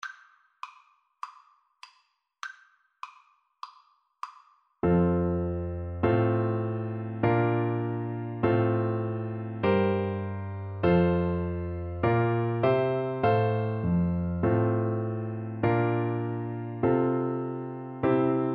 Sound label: twinkle twinkle, Recorder